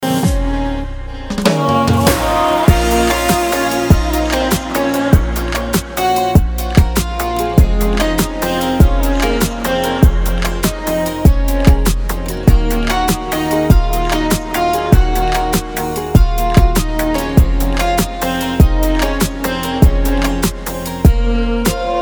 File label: Ringtone